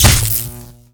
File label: Plasmagun Shell, Damage